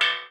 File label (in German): metallklang; klang im schnee